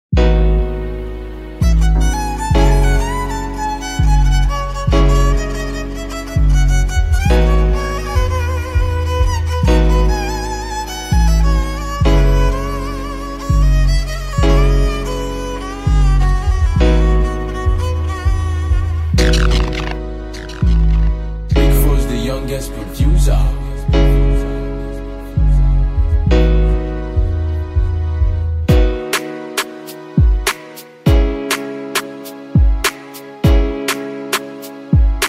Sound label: Instrumental